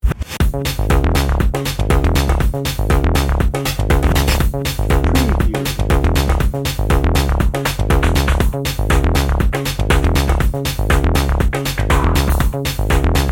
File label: synthetic motion dnb